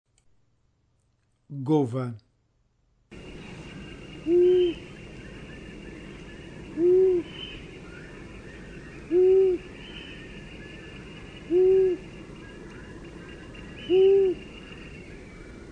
Gufo

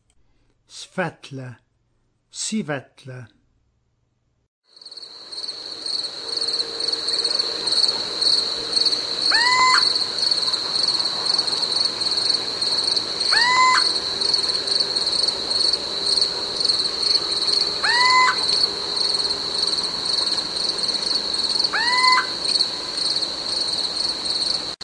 Civetta

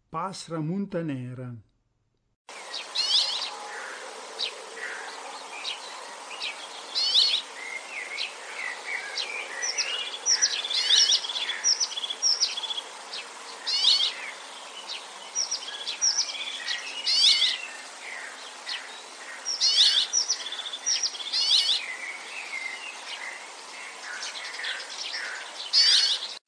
Passera lagia

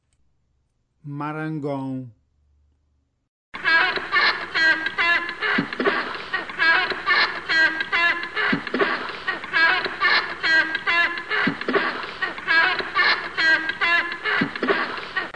Marangone dal ciuffo

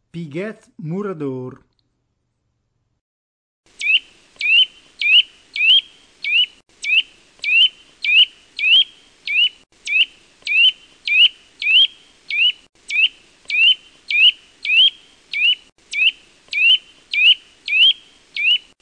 Picchio muratore

